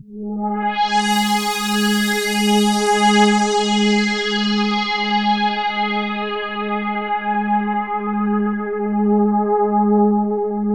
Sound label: sweep g#3; mothership; Nucleus Soundlab; Viral Outbreak